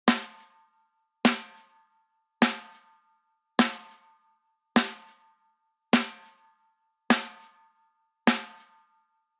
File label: smack Attack Off